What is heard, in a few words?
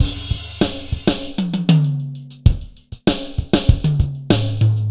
Drums; Sonic Foundry (Sony Creative Software) - Stylus Pressure Urban Grooves on Digital Wax